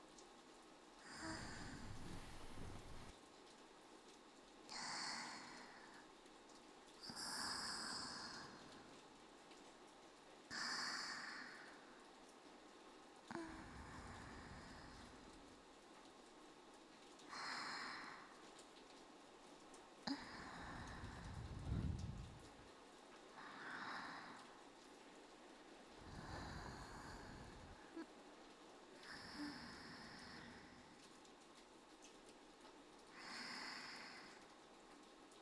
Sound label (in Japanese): 寝息ループ+雨の音, ASMR; オマケ 月乃の寝息ループ+雨の音